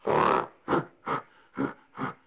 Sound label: growl, JUNGLE; AMBIENT; Pirates Of The Caribbean